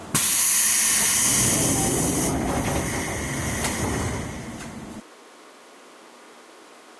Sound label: sb2000 door; tetsuon